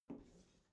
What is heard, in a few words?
bathroom cabinet open soft smooth; objekte; tueren